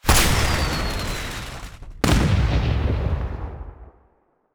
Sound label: signal bomb